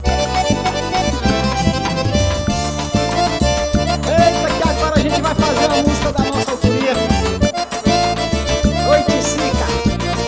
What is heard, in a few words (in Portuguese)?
banda ao vivo